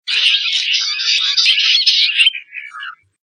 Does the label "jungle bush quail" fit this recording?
Yes